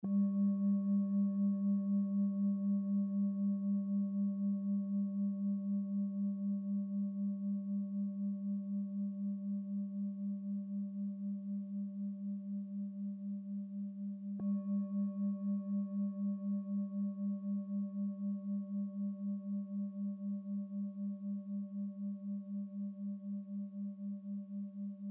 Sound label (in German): Hintergrund Klangschalen